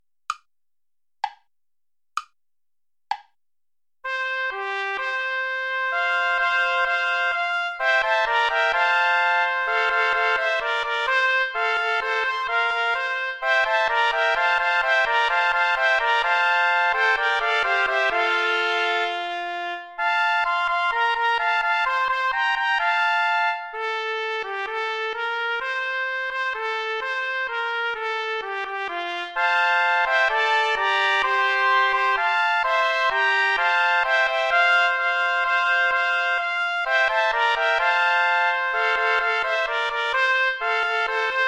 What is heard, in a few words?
play along without trumpet; praetorius michael psallite unigenito